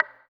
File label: VIBRA WAH, guitar; Spectrasonics; Bizarre Guitar